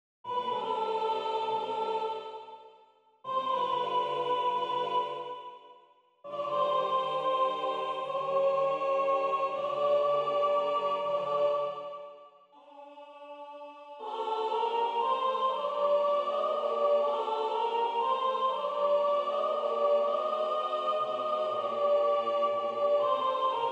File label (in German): Gloria mit Soli Sopran